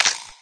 dry fire, paintgun; There; ThereClient

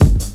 Kick Flabby

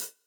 Hihat Closed Stick Hit

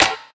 Clap Burned; One Shots; Drums; Ableton Live 12 Lite; Core Library

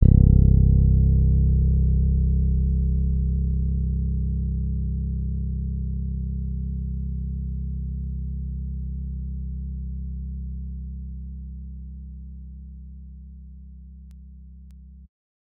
Electric Bass C1

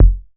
Kick Sine

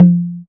Tabla; Drums; Misc Percussion

